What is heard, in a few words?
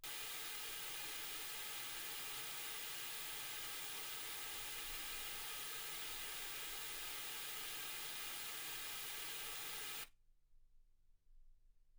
wgn 48kHz